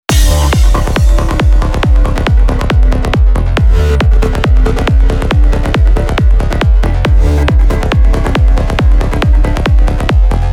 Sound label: goa trance mantra; mixkit